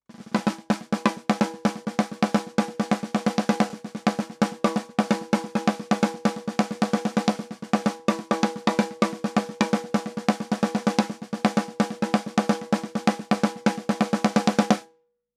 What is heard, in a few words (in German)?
1073 Preamp Vergleich Warm Audio WA73 SD 35 dB 300 Ohm